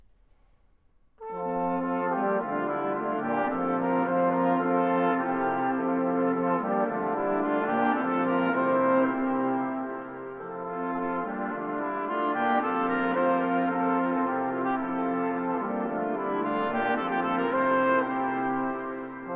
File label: Bransle Quartre Bransles, brass; Susato